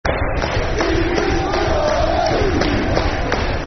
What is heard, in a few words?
Football Chants (Soccer Song); Steve Bruce's Barmy Army; Birmingham City Bcfc